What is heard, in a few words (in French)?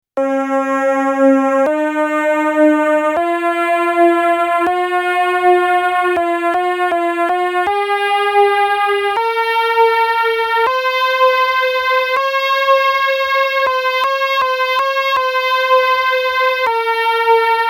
gamme majeure du systeme G